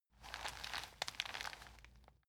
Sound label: plastic bag handle short